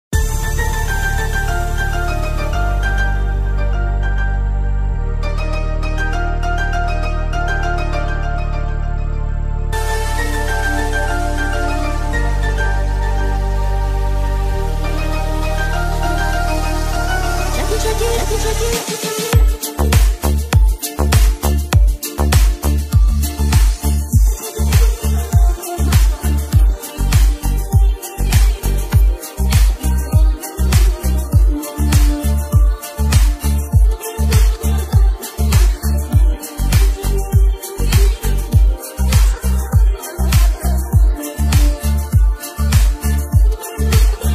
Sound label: Tajiki Remix Karaoke